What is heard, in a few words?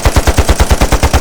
Assault Rifle Shot Loop; SFX Kit; Weapons